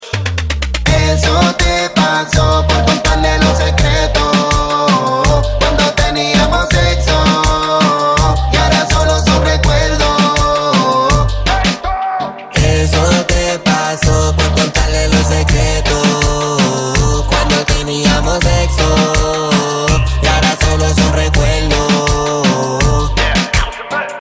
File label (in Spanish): reggaeton remix